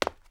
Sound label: FOOTSTEP CONCRETE; SIMUZENSOUND